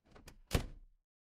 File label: door close